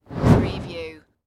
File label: Fire Torch Single Pass By Whoosh